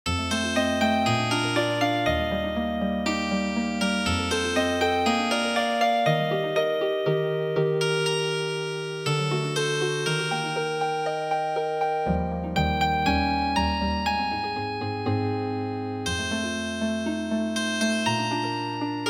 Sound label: Healing Harp, traditional; E Mama E